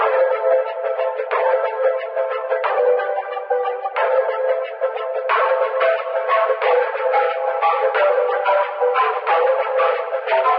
guitar, Phonky Loops

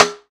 pins, Percussion Ticks; Misc Percussion

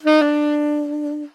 saxists, stabs

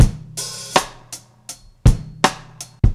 Drum loops, Boombap

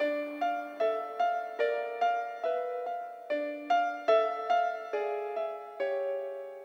piano, sad

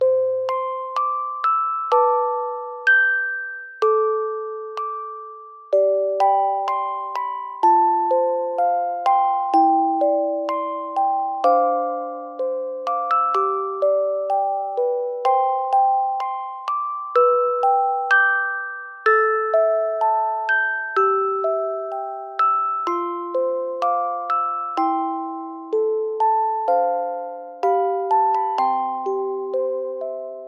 keys music box, Sad Loops

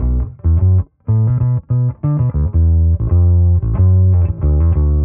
bass angular, Loops

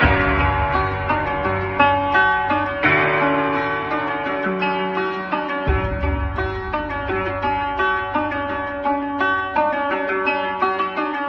guitar

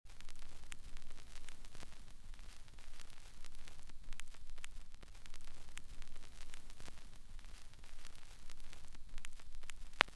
line noise, Vinyl; Misc Percussion

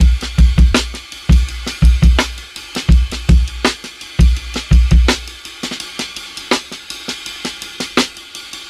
drop outs, Boombap drum loops

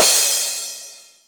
Cymbals

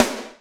Snares, Real

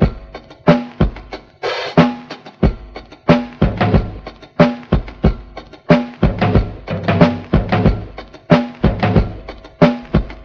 war drums sound, drum loops, funky

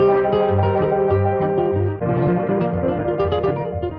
guitar suddenly fast, Loops; Phonky

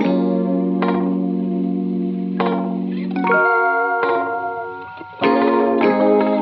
guitar, Phonky Loops

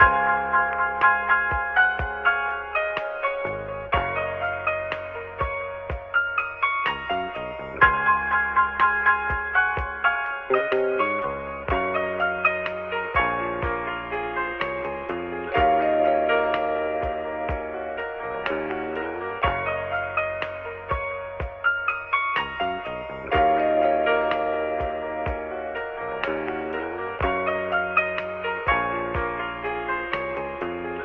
piano; Phonky

